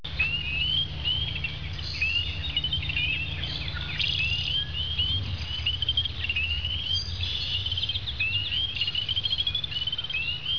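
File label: peeper, frog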